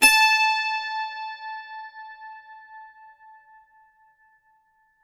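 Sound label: A5, dulcimer pluck; SoundBytes; Early Patches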